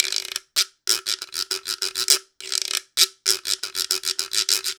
guiro

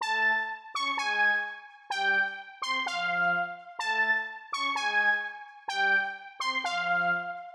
group brass

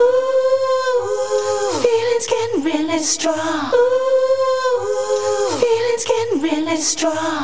Acapella

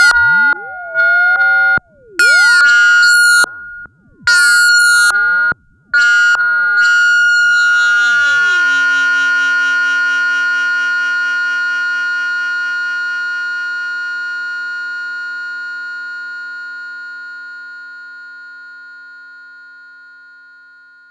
NOISE FX

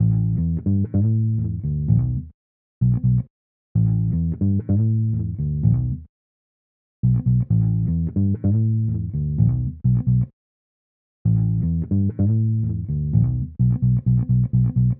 bass long break

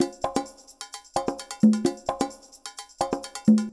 Loop Percussion 130

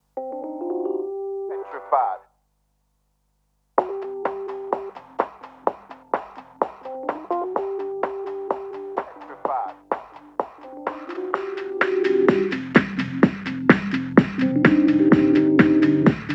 sequence vermona

